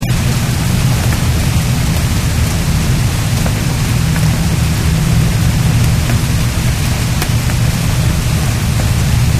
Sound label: rain new